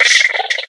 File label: box monster chitter